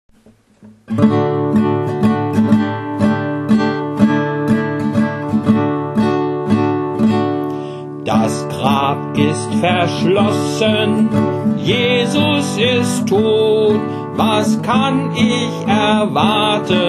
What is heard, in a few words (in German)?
Musik und Gesang